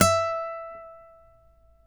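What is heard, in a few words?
nylon, FLAMENCO, Guitars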